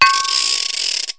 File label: clank, cartoon; Kaboom! Junior; accents